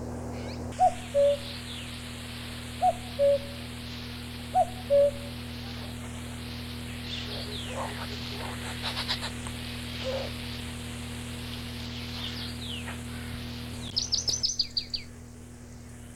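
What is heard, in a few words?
Cuculus canorus; Самец кукушки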